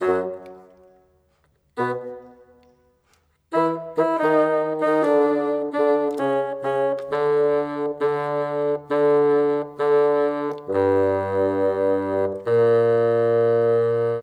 ORCHESTRAL

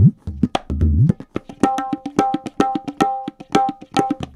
tabla funk 110

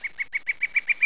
FLICKER; 鸟; animal

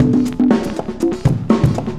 cooling 120, loops, 120bpm

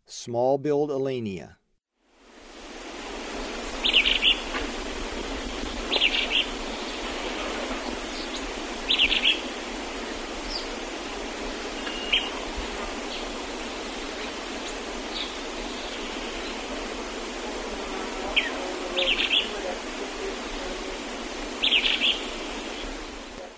Small-billed Elaenia; S. America; Eastern Lowlands

